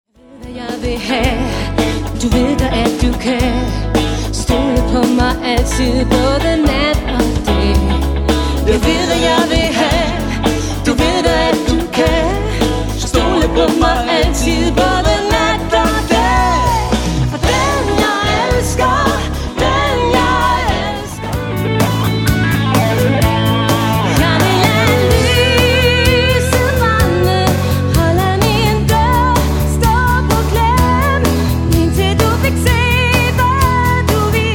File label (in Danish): collage dansk pop rock